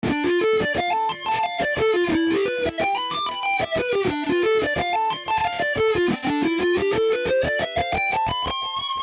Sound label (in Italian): Esercizio Sweep Tapping, guitar